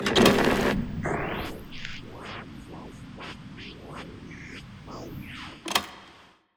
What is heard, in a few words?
Basement Record Player